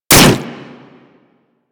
Hammer Weapon Catch; Hammer Boss

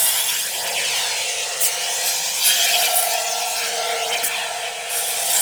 Med Station Gas Loop; Warframe; Lasrian; Lasrian Soldiers; Weapons

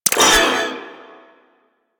Mech, Weapons; Warframe; Cephalon; Ceph Primary

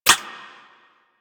Ricochet; Warframe; Cowgirl

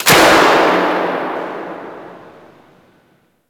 Ocean Light Clunk Small; Ambience; Warframe; Grineer Ocean

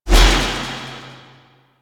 Alt Throw, Spear Gun; BE Ferrox

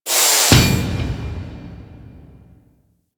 Organ Pipe Close; Ambience; Orokin Moon; Warframe

